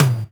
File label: Tom EDM Bit Crush; Drums